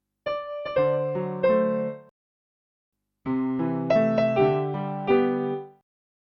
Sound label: grace note 7 (short) versus grace note 10 (long)